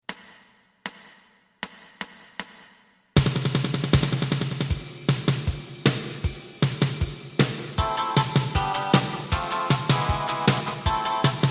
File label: bass